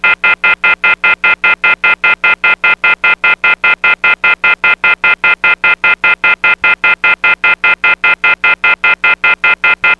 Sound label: Off Hook, phone; PhoneSoundz 1.0; Phreaking